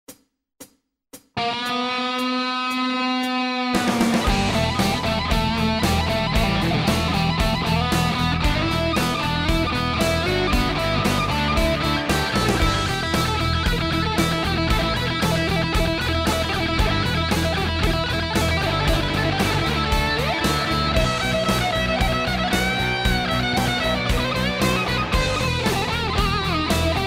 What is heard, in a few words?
Rock Jam